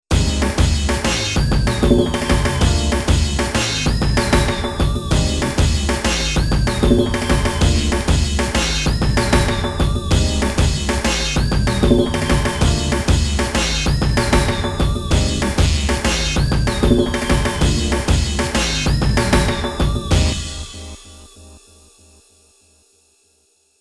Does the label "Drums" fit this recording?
Yes